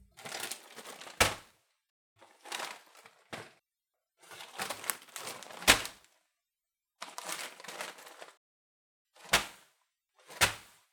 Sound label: Bag Of Chips Dropped On Counter Top